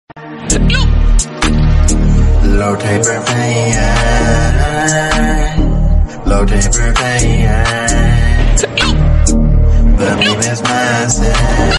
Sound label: song